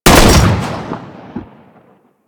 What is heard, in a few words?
Fire, Weapons; Strun